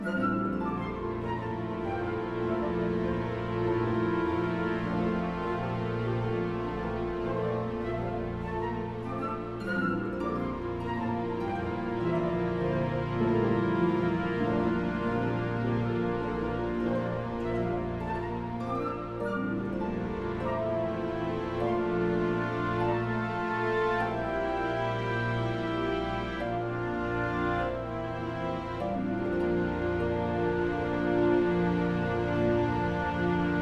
Deception 50bpm Loop; Music Dialogue

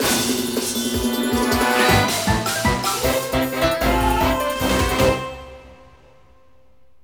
Level Clear; Donald Duck Goin' Quackers; GC, PS1, PS2

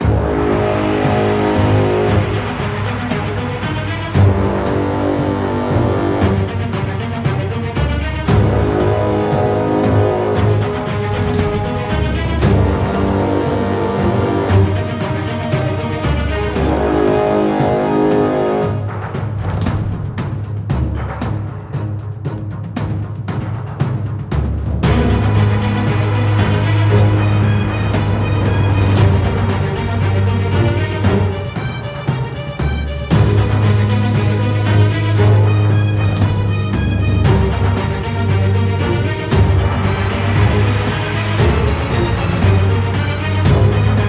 Fight; Father Wolf Fight level 3

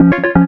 KEY Hold Loop Start

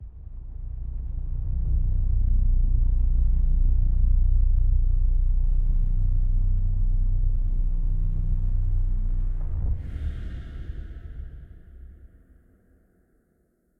energy Crystal rising; Desert SFX; Desert Mech

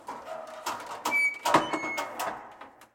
Wheel Rotat Creak

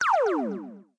Laser On; cut the rope time travel; android

